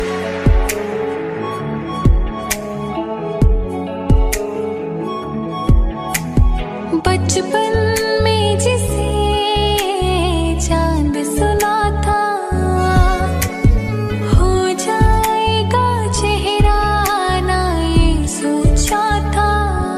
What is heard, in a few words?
Sad Hindi